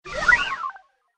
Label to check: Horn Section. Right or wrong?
Wrong